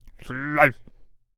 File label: Protector Attack Vocals